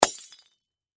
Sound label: Crash Glass Bulb Christmas Break Drop; Sound Effects:Materials; Blastwave